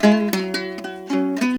HALAM MELODY; Best Service; World Colours

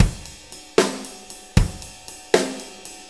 6-8 Loop, Full Kit Loops; Sonic Foundry; Loops & Samples Drum Tools

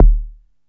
bassdrums, techno; Techno ID; single-drums

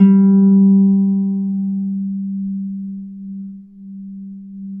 G2 HARP; USB Soundscan vol.62; AKAI

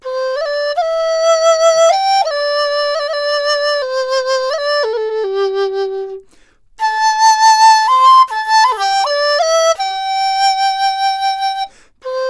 DIZU, 90; INIS - Opium

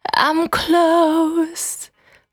Cry 68bpm B, Vocal; Zero-G

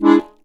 HOHNER E, akkordeon; Best Service; Alpine Volksmusik

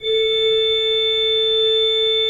SWL A3, Cathedral Organ, SCHWELLWERK; Propeller Island

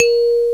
BAR, MALLETS; FAIRLIGHT CMI IIX

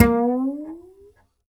Upright Acoustic Bass; Larry Seyer

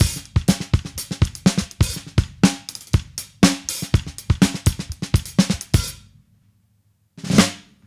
Drums Fill, 70s Analog Funk; Sonic Foundry (Sony Creative Software); What it Is!; Super Brown

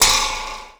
SPRING; Rarefaction; Poke in The Ear With A Sharp Stick

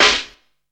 heavyness, snares; Zero-G; Funky Elements

